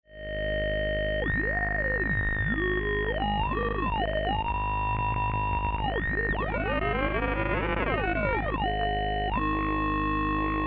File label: Oh yeah; TTSH Patchbook